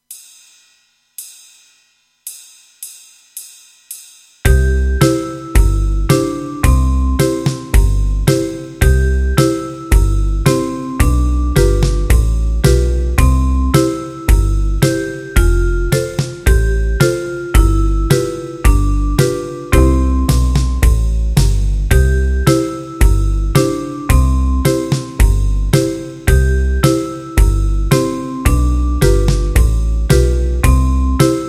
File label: 110bpm; rag no 1